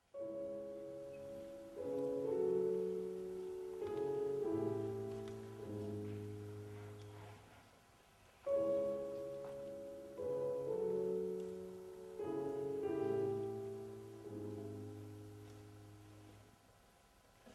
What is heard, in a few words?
Nocturne Ut mineur; CHOPIN